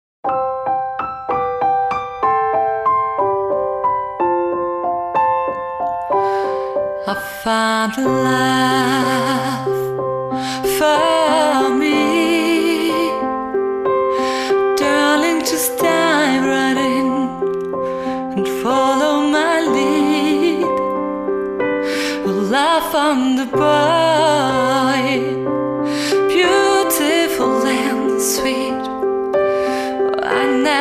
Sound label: Acoustic Unplugged